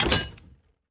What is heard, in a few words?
Tongs Irontrap Drop